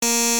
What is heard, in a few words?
Buzzer Button